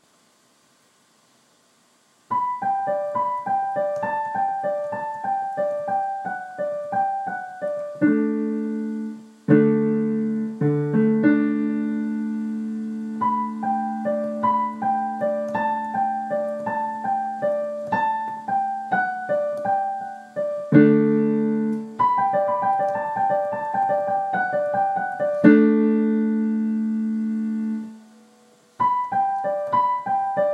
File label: Dino Piano Song